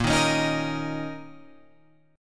Bb Minor Double Flat 5th, Guitar, Open F; 10,10,13,10,13,10; strummed; chord shape

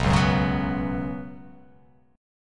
B Suspended 2nd chord; strummed; JGuitar

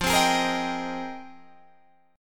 F Minor 11th, Guitar DADGAD

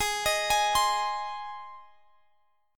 Ab Major Ab, Guitar Standard; arpeggiated; x,x,18,20,21,20; JGuitar

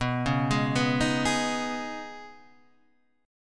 C sharp 7th Suspended 2nd B

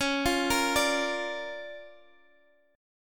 D Minor Major 7th Sharp 5th, Db, guitar

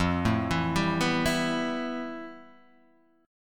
F Major Sharp 11th Guitar DADGAD, chord

